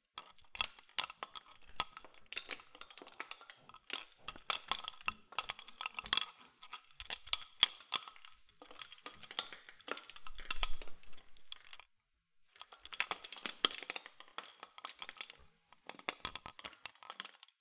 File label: Jingling Wooden Ornament